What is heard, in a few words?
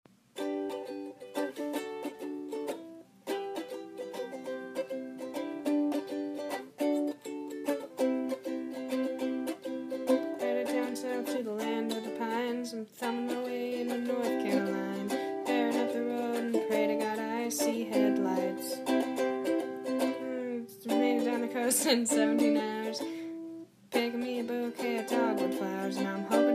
Ukulele; 4/28/16, 10 PM, My room